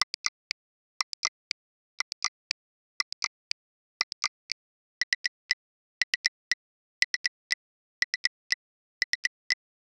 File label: cinematic sound design, time